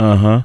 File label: UnnnHnnn; Mo' Phatt; Digital Sound Factory Mo' Phatt